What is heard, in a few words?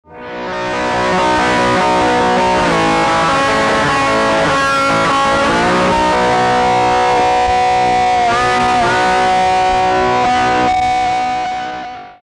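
Feedback Slide, guitar; guitar slide version; feedback generator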